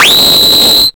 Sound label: EL SCREAM, Hard & Loud Techno; USB Soundscan vol.01; AKAI